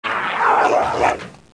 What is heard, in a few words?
Zipper clothing; dongwu